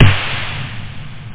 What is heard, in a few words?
snare